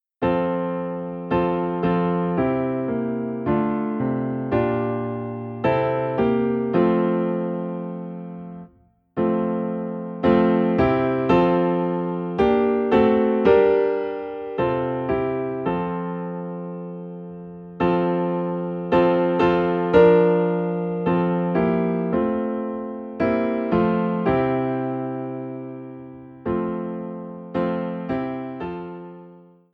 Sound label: Piano in F; Played on a perfectly tuned piano by a church pianist in hymnal style.; Each song begins with the last line introduction; When I Survey the Wondrous Cross